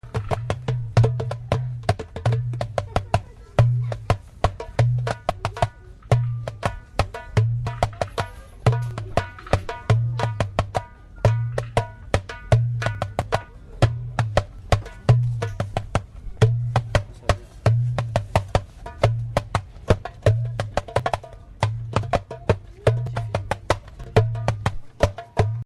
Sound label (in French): derb